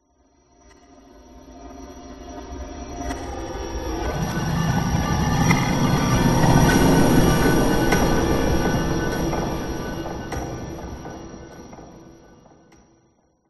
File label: rising doom; the sound pack tree